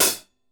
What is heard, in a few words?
SHORT HIT, HI-HATS; Sampleheads; New York City Drumworks VOL-1; KD HI-HATS